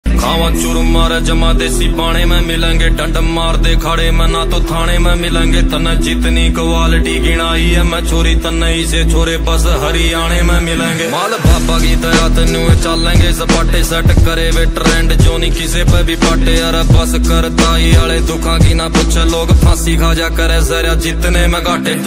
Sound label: Ringtone